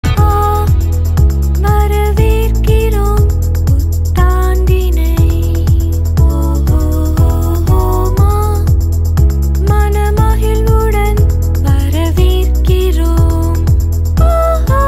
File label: Tamil Ringtone